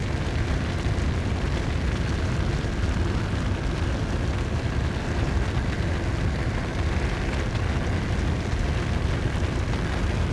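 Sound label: damaged train loop; Star Wars Obi Wan; XBOX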